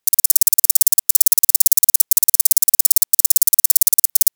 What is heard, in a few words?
tetra dmo